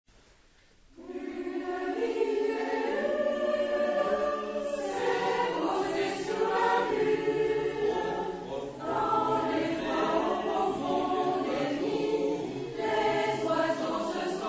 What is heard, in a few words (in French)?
berceuse russe; 22Khz